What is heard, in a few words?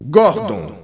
E BASS